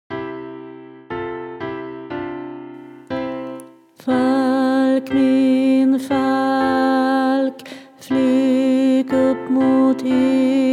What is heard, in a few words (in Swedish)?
baryton; fredagskoren